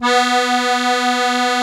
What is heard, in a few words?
ZUPAN CHRO, AKKORDEON; Best Service; Alpine Volksmusik